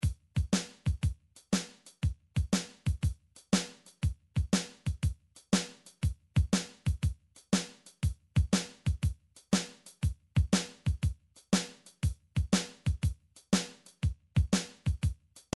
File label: drums shuffle, midi drum patterns